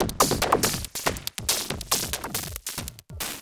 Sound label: Beat, Industrial